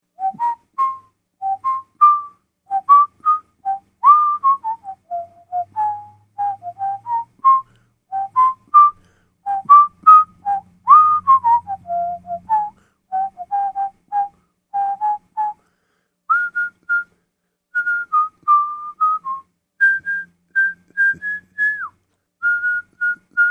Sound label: Whistle Theme